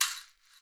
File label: SHAKKA, Ethno Techno; ILIO - Ethno Techno